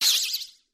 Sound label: katana clash